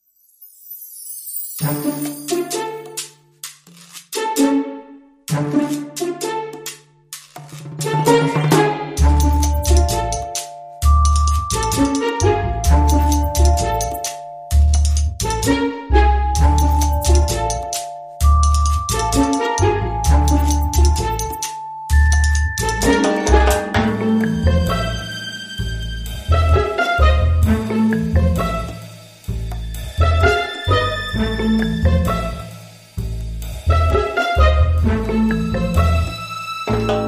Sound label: gt pizz comedy